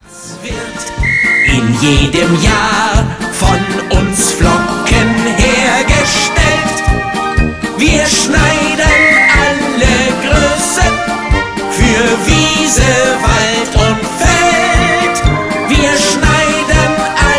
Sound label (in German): flockensong